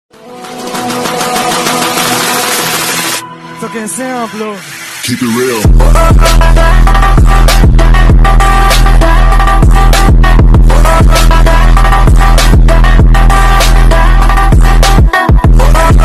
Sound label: background music ringtone; carryminati